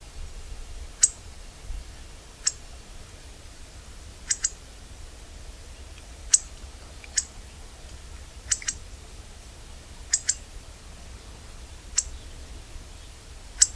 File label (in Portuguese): bico grossudo